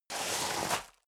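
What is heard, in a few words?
step boots gravel medium slide long, footsteps; schritte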